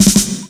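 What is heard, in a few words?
snare roll, Ragga & Dub Reggae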